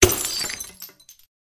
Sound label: Broken glass impact hard hit; Glass Sound Effects